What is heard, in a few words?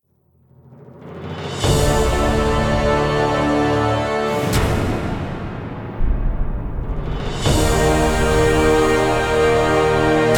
music; Hercules